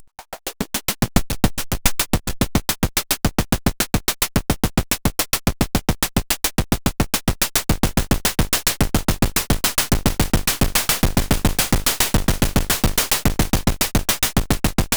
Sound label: Morphing Groove; Body Synths Metal Fetishist